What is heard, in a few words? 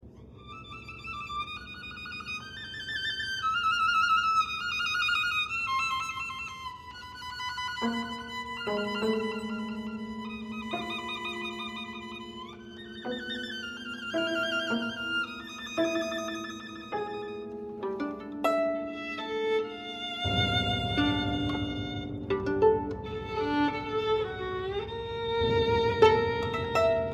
Sonata for Violin and Piano